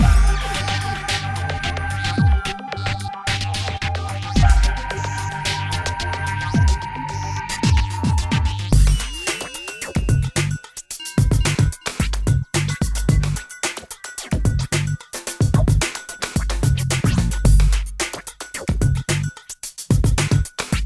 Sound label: Police Inside Offbeat Loop; True Crime New York City; Xbox; Shared Da Police Inside Offbeat Loop